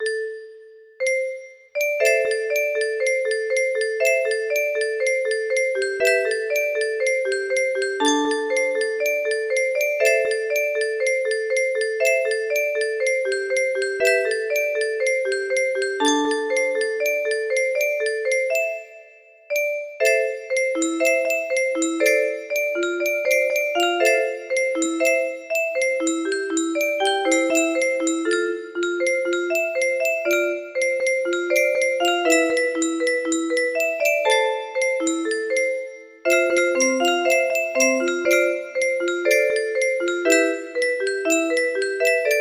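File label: music box melody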